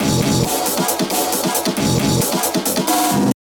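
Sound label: drum beat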